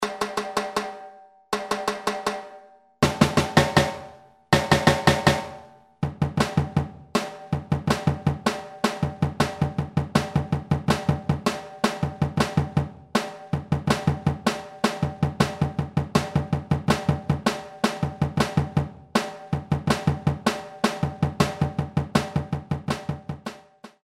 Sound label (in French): samba reggae tamborim sourdo caisse